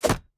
environment object grab magic scroll

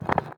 building barrier destroy

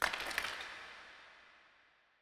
ambience room necromastery bones

